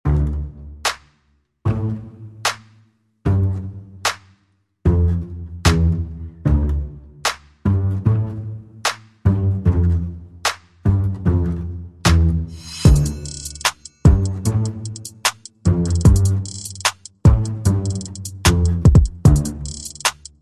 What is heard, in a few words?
Drums and Bass Only